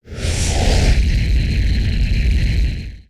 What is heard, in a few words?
rocket land